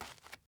dirt step

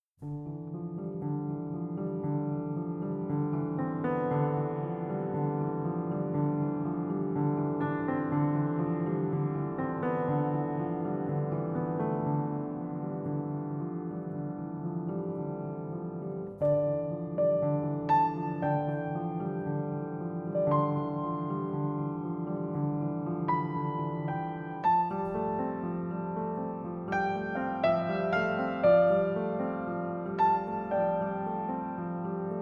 Piano Interlude; nethack legacy

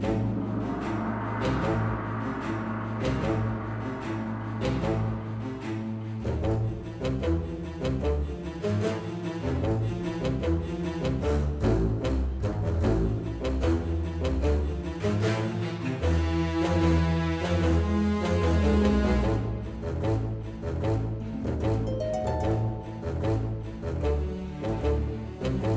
Chase Layer Bass

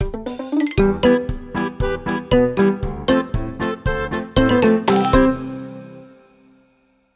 Game Over; Farm Mania 2

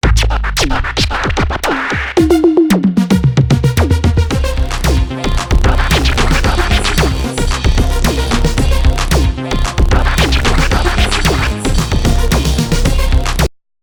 Disco; Scooby-Doo First Frights

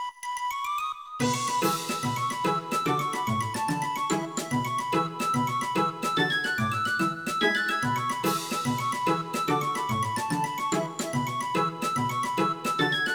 HARP; magical drop; ps1

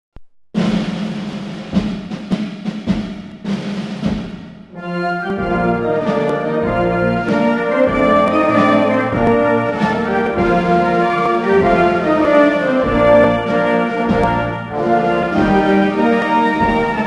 slow march; AUS WAR Maria-Theresa-slow-march

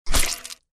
Sfx Enemy Murloc Dead

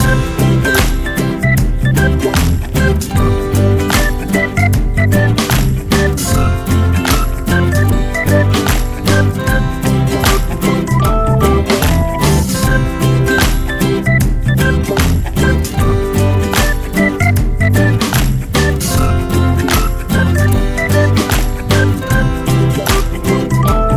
Music Shop; Learn to Fly 3

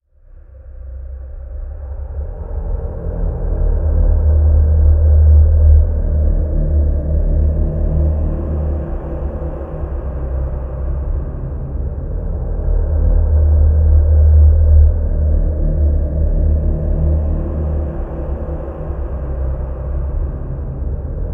environment graveyard stinger winds long; Aragami

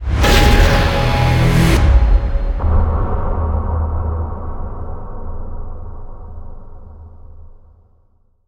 Jingle Game Over; Hatred

